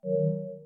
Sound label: back button